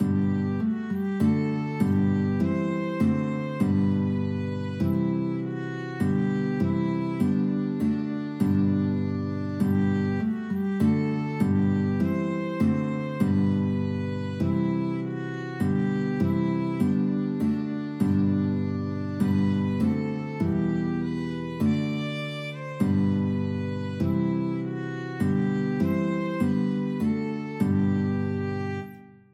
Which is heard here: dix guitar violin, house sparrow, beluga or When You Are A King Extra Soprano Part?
dix guitar violin